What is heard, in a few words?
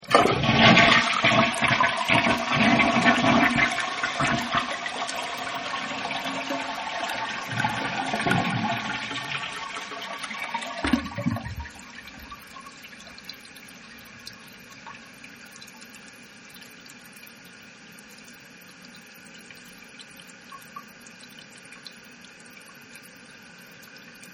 Toilet Water Tank, Toilet Flush And Watertank Slowly Fills Back Up With Water; Bathroom; Household; Michael Huang